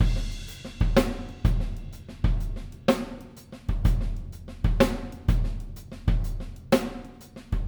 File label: drum dry